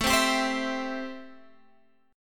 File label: A-Minor 7th A Guitar Standard; x,12,10,12,13,12; Am7 strummed; JGuitar